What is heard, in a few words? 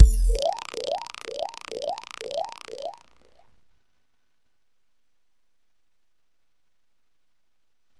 sfx water boiling